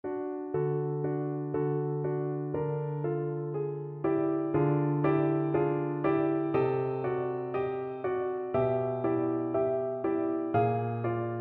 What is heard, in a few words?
romeo, Viola